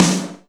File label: Ensoniq VFX-SD Snare